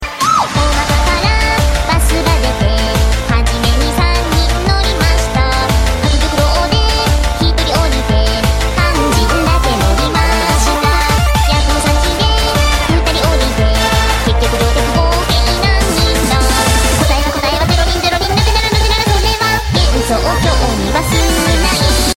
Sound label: anime ring